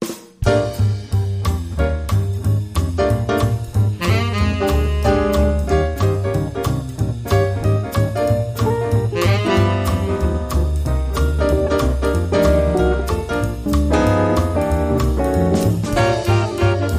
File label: Sax, No Theme